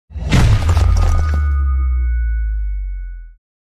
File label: Superhero landing heavy